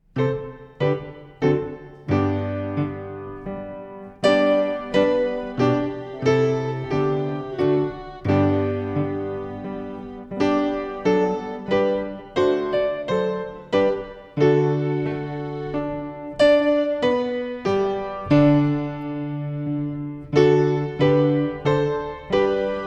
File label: Piano organ; Come Thou Almighty King